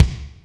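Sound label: kick Tite Hall, Alesis D4; 300 Drum Machines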